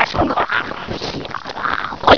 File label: chat